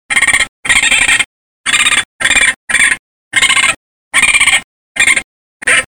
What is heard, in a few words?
Raccoon Squall Adult